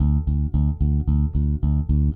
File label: Eighth Notes D, Bass; Bass X